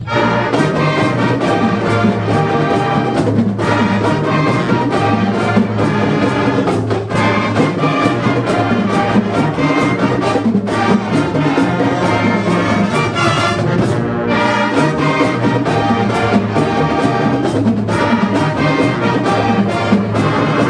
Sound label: Tiger Fight Song